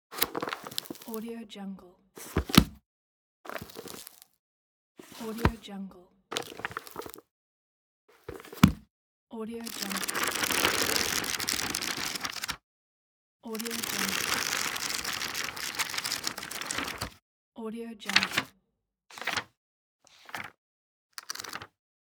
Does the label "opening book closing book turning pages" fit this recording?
Yes